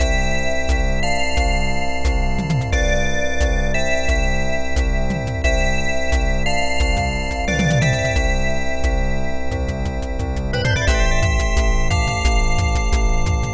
A Victorious Bell is Ringing Bell; Herzog Zwei; Technosoft; Mega Drive

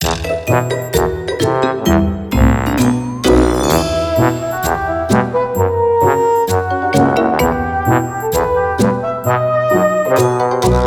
Comic

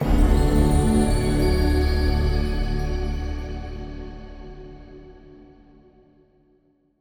Stingers sting gain huge; Hand of Fate 2

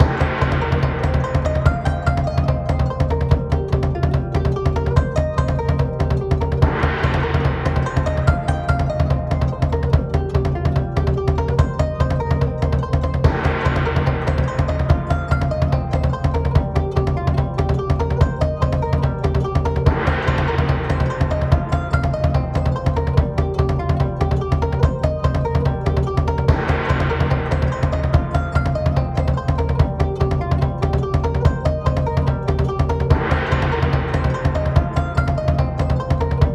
Samurai Music; Super Samurai Rampage android ios mobile windows gamerip 2017

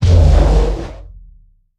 Parasite Star Hit Miss; We Are the Dwarves

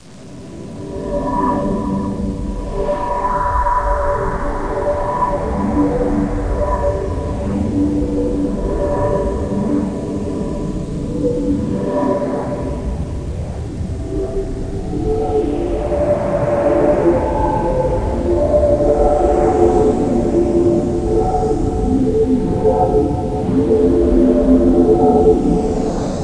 Pad; Great Eagle 2

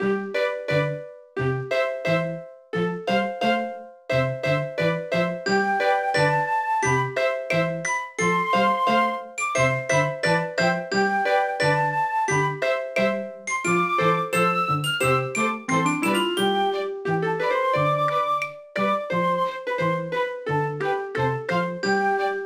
Music Menu

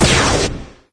Colour Bomb; candy crush saga gamerip

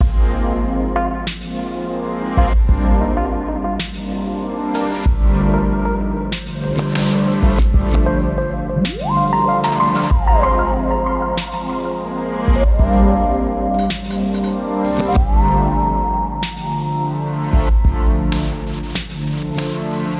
bg track F 95bpm

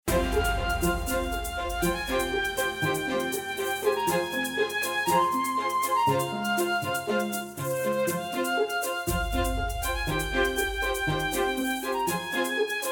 main menu music; All Guns On Deck

